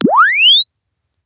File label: Press Two Sides Chirp; Samsung 4 Recordings